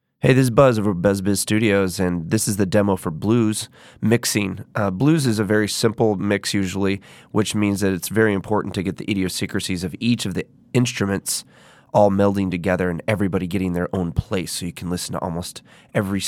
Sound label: mixing blues